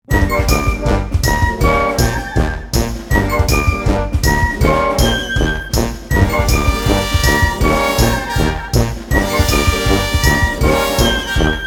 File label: Picknick uptempo Brass